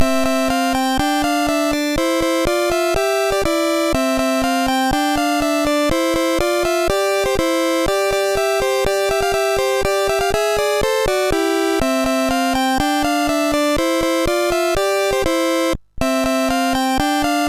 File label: Dragons Den, MOS6581R3